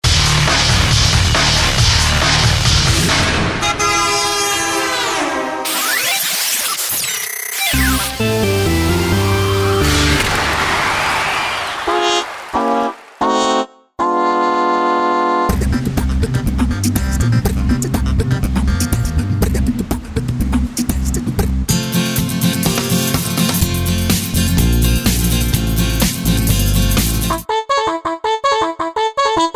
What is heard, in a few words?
Music